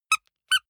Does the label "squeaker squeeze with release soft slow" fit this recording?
Yes